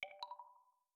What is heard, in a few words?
Incoming Group Chat Invite; BF4; Origin